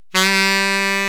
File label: sax G2, Sax Balzy, EMU E-III